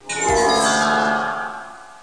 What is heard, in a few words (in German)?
Teppich